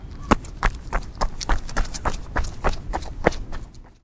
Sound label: start running